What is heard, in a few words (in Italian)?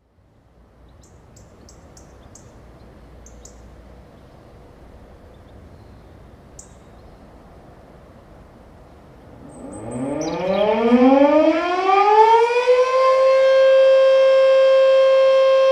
sirena ricordo guerra, suoni ambientali; Alta Vallagarina; calliano; Portobeseno